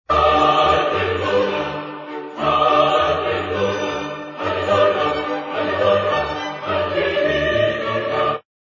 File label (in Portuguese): Vinheta Aleluia